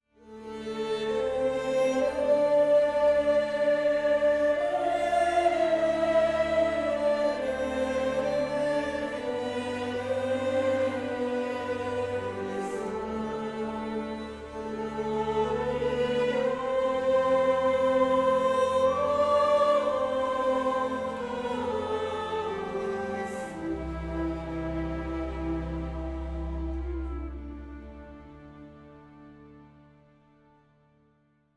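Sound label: Music Cue Vocal Theme; Crusader Kings III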